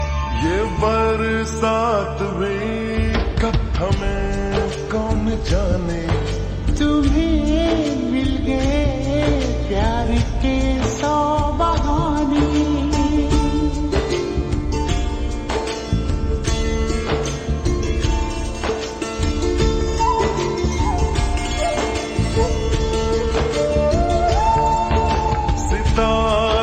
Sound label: Slow Reverb Version Ringtone